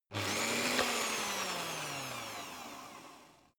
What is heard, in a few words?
electric whisk rev; haus-garten; kueche